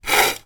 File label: toy gun plastic slide on table